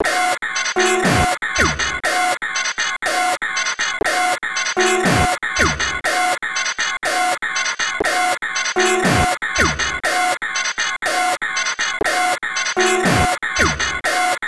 Plug Loop One, Underground UK House & Electro, Pads; Sonic Foundry (Sony Creative Software)